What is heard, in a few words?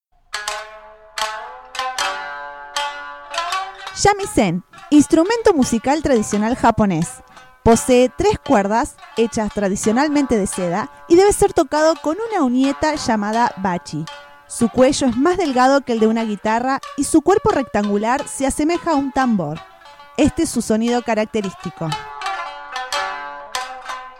shamisen; promo; bakaradio